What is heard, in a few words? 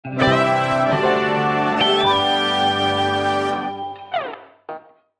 division upgrade